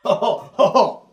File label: hoho hoho, ooh ahh